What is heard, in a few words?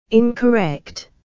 incorrect